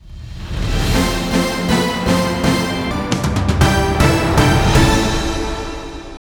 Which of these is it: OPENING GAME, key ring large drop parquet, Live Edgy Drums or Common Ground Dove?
OPENING GAME